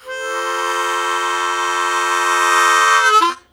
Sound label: Blues Chord Attack F1, Harmonica; 90 s Sample CDs; Zero G